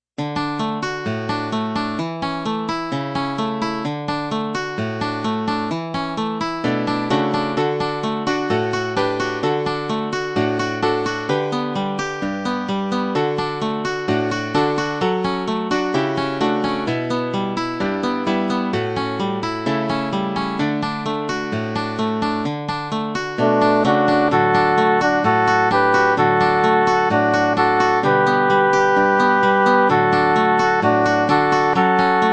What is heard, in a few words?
music